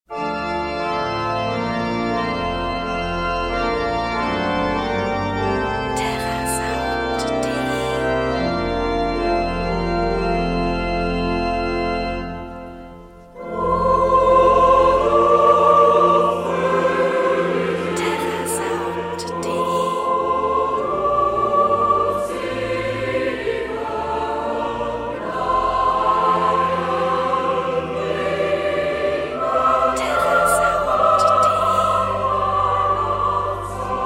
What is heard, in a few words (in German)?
o du froehliche chor; terrasound